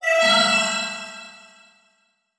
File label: Transition Whoosh Alarm